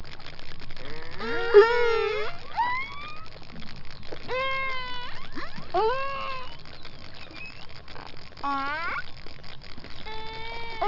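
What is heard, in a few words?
calling short fin pilots, Raja Ampat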